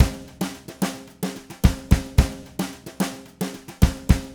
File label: Red Maple Groove 110, DW Red Maple Kit